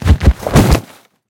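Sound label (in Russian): сокрушительный удар с мягким падением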